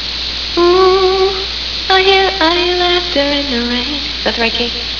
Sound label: laughter